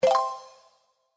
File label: Spin Stop Win, slots; Gem Fortunes